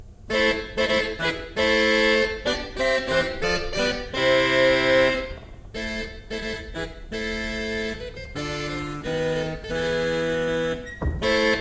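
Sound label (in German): Grenzing 7 II P, pfeifenorgeln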